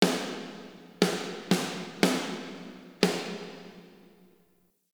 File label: Stadium Sound Check Drums; Warframe; Ambience; Nineteen Ninety Nine